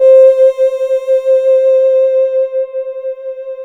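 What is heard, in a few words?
synth Reso Table; Best Service Gigapack 1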